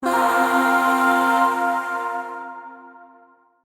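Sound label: Shawzin Pentatonic Minor Chord; Warframe; Zariman; Void Shawzin; Chords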